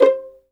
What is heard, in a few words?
STR 2 PIZZ C5, Vlns Pizz; Roland L-CD702